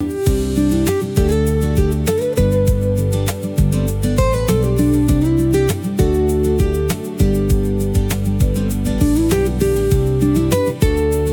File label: loop; bgm; narration